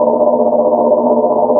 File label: lazer loop